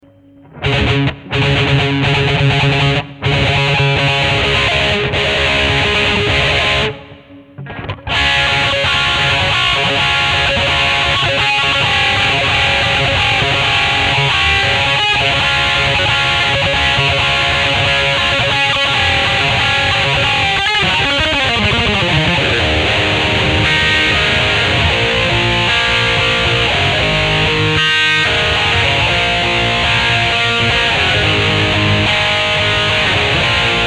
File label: Recto dist, guitar